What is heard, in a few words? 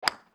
Golf Hit Ball; Toontown Online